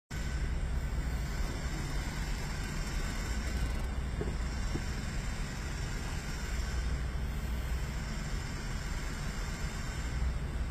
weird rattling noise when i m stopped, honda fit 2015 ex; 3rd generation 2015